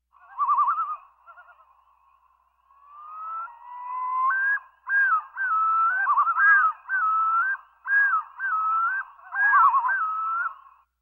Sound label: Birds